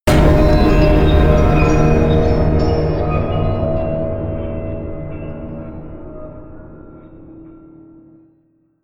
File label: distorted bell low